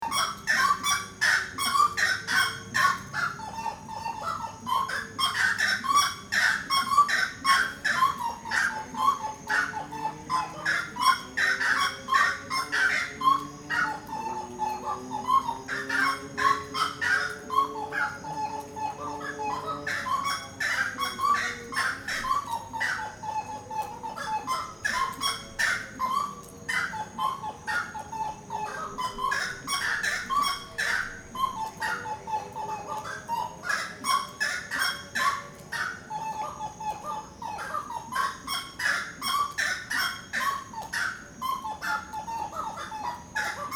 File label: Sounds of Osa Birds